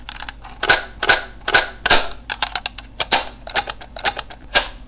merge mags; firearms

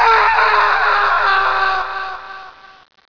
ahhh; cstrike

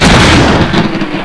ball lightning; wizardwars; spells; combos

